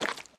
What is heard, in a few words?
slime run, step; blocks; Minecraft; Remastered